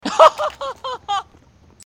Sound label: hahahahohoa jingle